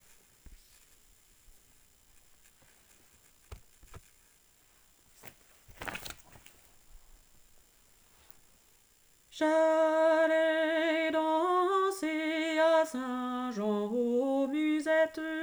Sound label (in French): Basses